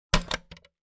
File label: Part Assembly; TOOLKIT vol.2